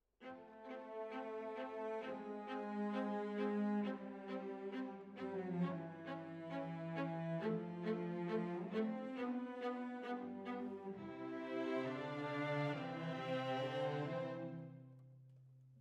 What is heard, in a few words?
Violas, jazz for piano